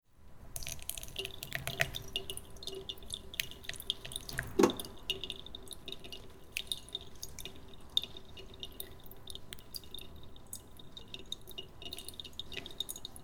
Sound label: drip coffee outdoor